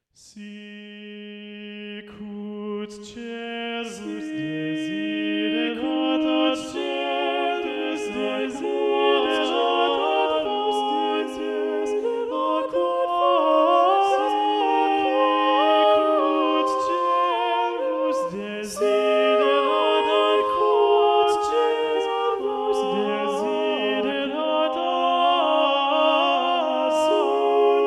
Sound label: bass muted; sicut cervus; palestrina